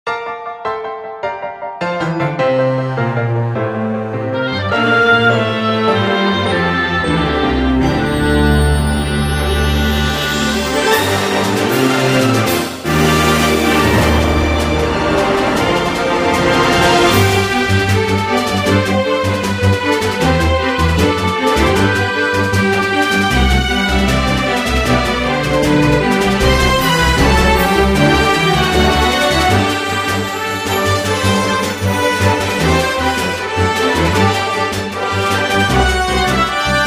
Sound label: Battle BGM